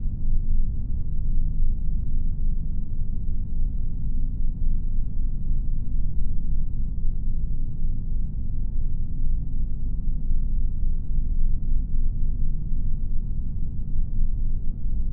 Roomtone, Hvac, Drone, Hum, Low Mids, Loop; Hzandbits; Hvac Elements

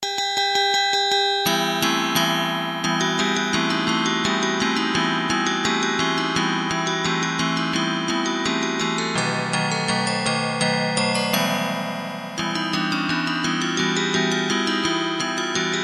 Bells, Unclassified Electronic; Edgar's Bells - Movement 3 Brazen

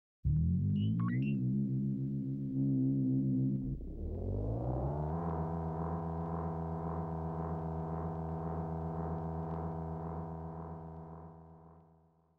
Space Fantasy, Flying Saucer; Flying Saucer Take; BBC Sound Effects Library

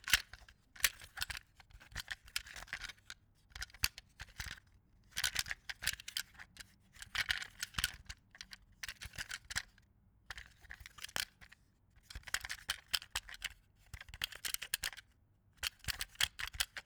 CASSETTE PLAYER FOLEY Cassette Movement; Cassette Player CR2080; Ugurcan Orcun

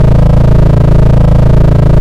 engine; Kenney's Sound Pack; Retro Sounds 2

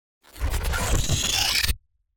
HEAVY, SCI-FI Mechanics; Phil Michalski

